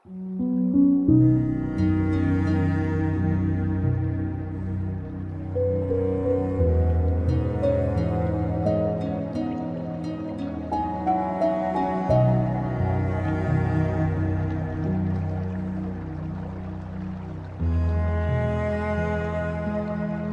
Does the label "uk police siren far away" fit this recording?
No